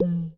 Slime Vox Big

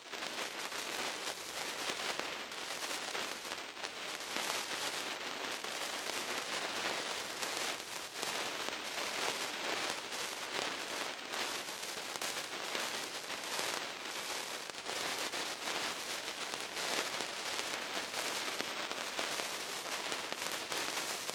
sparks

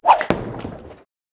Rollball

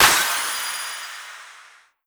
Change Clap; disney star smash android ios mobile gamerip 2020

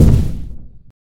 Stomps; Forestboss

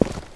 Step; Elevation

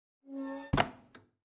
Window Close

